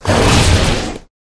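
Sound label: Dragon Skeleton buff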